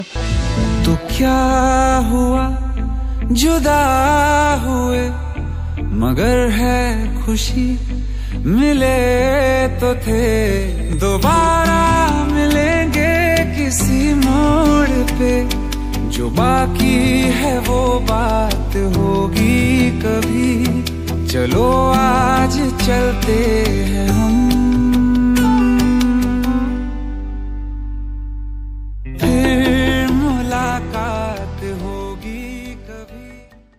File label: Sad Song Ringtone